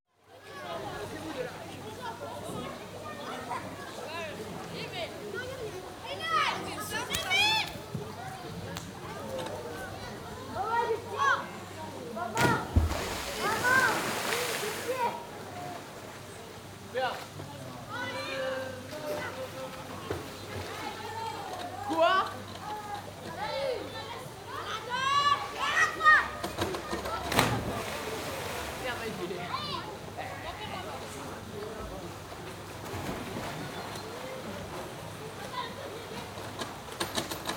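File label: Swimming Pool, exterior atmosphere ambience with people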